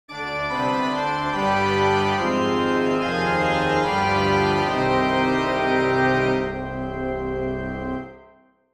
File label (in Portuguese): Fuga para órgão em fá menor; Bach